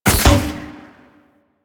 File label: Heavy Grenade Launcher Thunk; Warframe; Archwing